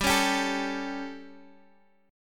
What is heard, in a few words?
G Suspended 2nd Flat 5th G, Guitar, Open G; chordshape; down